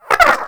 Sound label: Wyrm Idle, Vocals; Pets